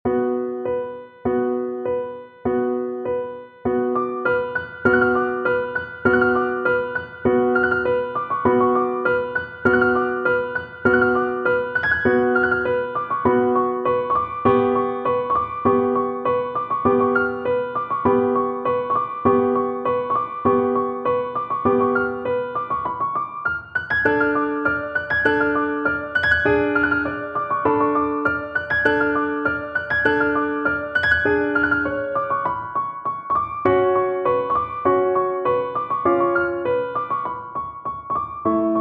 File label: bartok romanian folkdance piano